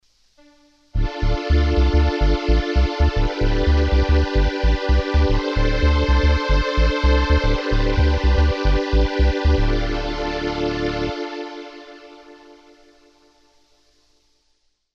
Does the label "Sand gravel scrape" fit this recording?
No